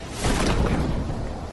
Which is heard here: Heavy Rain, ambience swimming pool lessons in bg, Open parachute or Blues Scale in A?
Open parachute